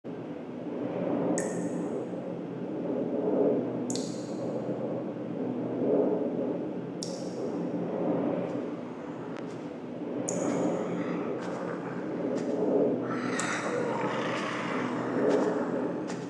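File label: scary sounds; strashnye zvuki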